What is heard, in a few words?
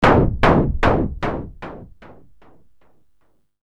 forge